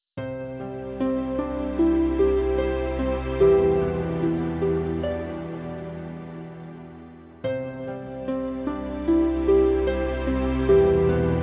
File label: menu; tacit